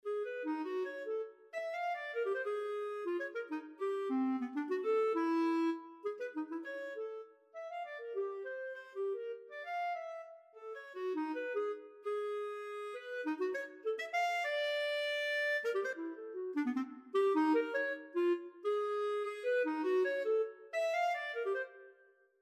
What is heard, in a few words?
clarinet in e flat; woodwind instruments; The task for this project was to compose nine pieces for woodwind instruments for nine dif